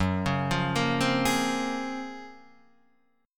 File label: Gb Major Sharp 11th, Guitar DADGAD; GbM#11 arpeggiated